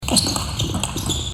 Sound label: Table Tennis, A Game Of Table Tennis In Action